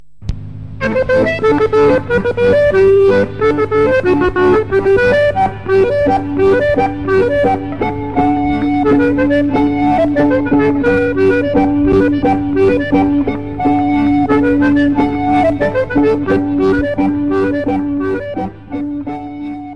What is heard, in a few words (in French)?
Rumelaj - Bulgarie